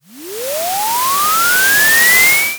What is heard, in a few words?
impact riser